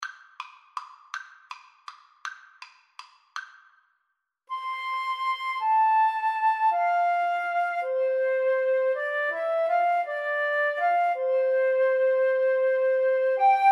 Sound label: Flute Duet